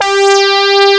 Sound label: lead